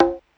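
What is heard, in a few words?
Percussion pss795 bongo high; DRUMS; Polyend; Legowelt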